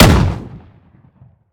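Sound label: romeo870 fire player, weapons; gmod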